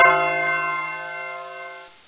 Bell

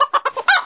Chicken

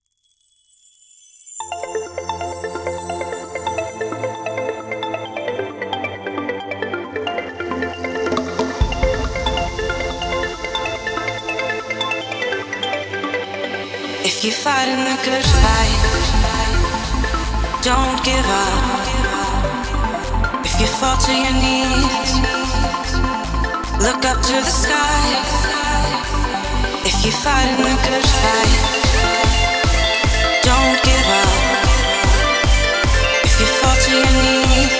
Hardstyle

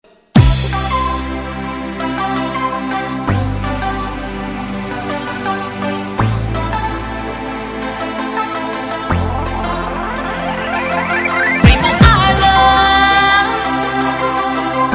happy hardcore

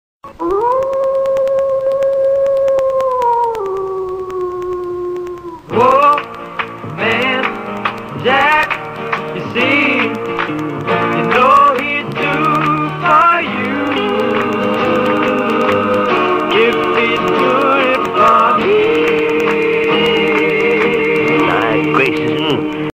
Jingle intro

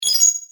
Collect Item

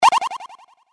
Power Up

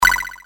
Menu Hover Over, Midi

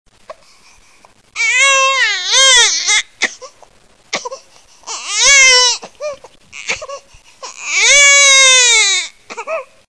baby cry

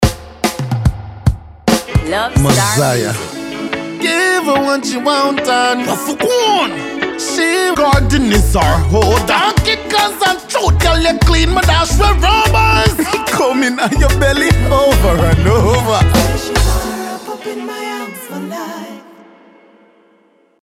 Reggae

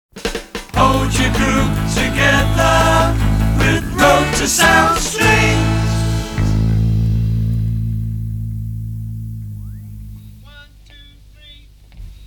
Rotosound Strings

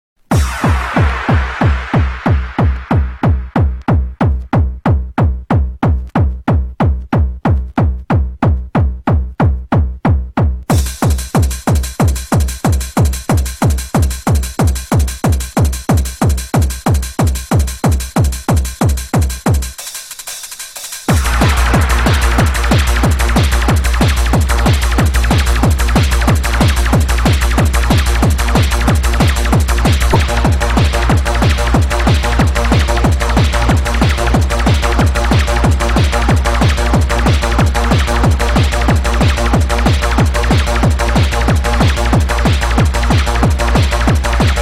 HAPPY HARDCORE REMIX

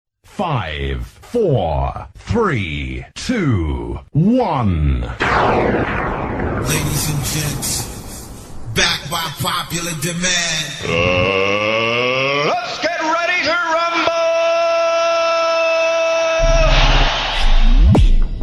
Rumble

